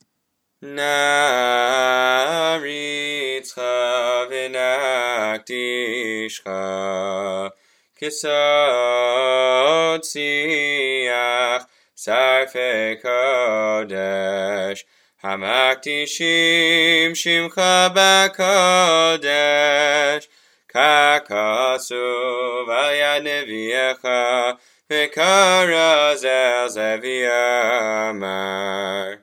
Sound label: Naaritzcha Classic Melody; Musaf